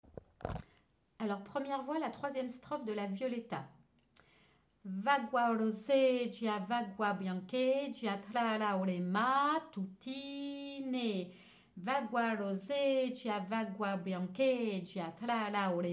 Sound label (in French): voix texte plus chant